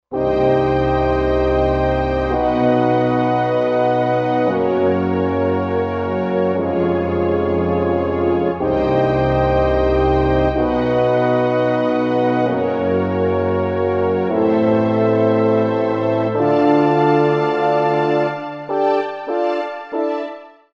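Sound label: French Horn & Strings, Korg Wavestation A-D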